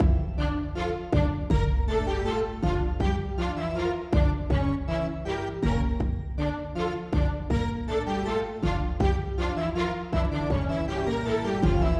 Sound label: Baroque Rush loop, Cinematic Battle Music